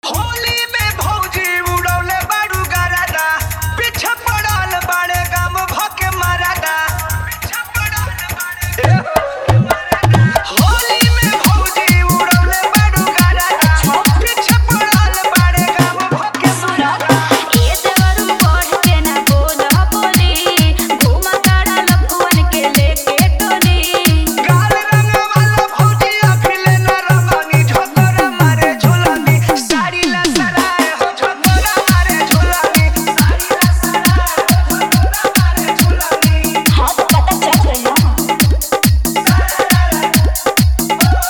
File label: Full Hard Dhollki Bass Mix; Holi